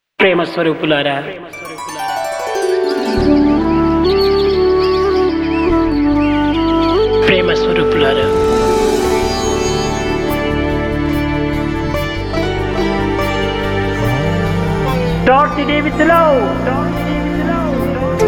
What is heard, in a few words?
Alarm Ringtone; radiosai; Start the day with love